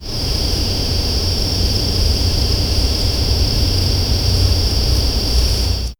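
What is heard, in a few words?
soft crackling of a distant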